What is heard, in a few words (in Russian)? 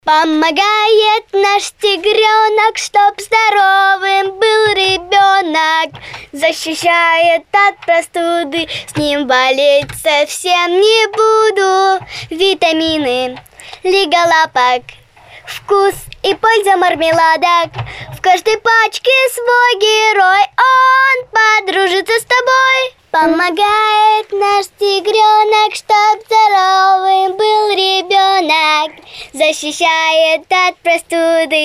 vokal